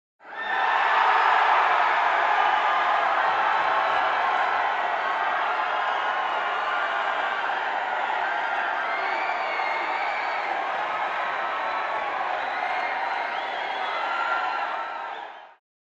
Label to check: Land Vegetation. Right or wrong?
Wrong